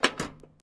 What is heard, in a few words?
chain; mcheli